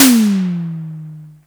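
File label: Simmons tom, SDS 8 Tom (Long)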